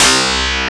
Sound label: SYN FX SCI, dance; Sound & Vision - Gigapack II